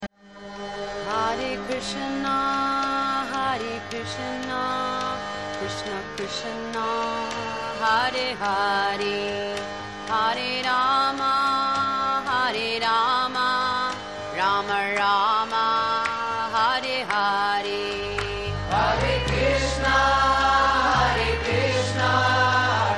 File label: Hare Krishna Maha Mantra